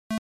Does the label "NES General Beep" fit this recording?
Yes